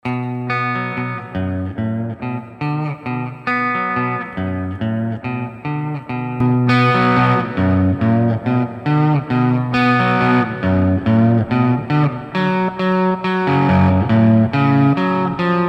Sunface light boost, Sunface on light boost playing bass line.; Sunface Sound Clip 3